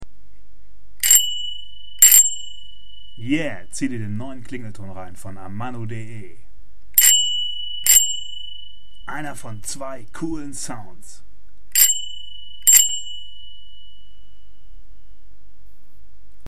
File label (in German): klingelton